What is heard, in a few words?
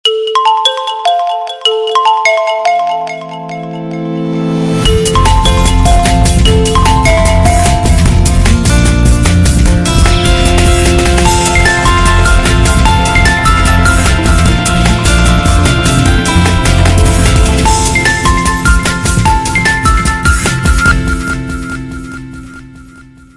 ringtone; oppo original